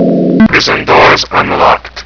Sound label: unlocked; tfc